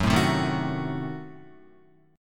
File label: Gb Diminished 7th, down, Guitar; strummed; 4F,4A,5C,5G,5C,6E; JGuitar